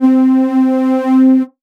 C Synth, One Shots

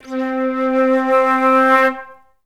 FL CRSC C3, Advanced Orchestra; Best Service

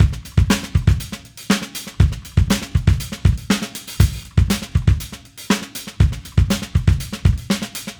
Funky Drums; Sonic Foundry (Sony Creative Software); What it Is! 70s Analog Funk; The Funky Mama

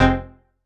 stab tech, stabs

